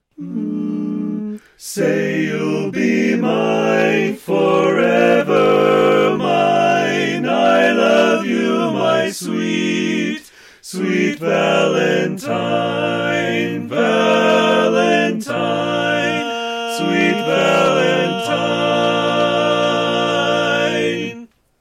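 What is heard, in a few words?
Baritone, barbershop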